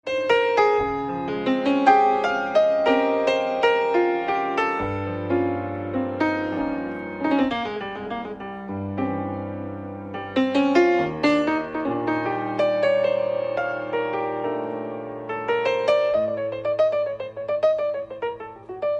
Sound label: piano live